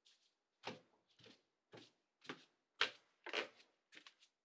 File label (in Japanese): 廊下を歩く(靴下)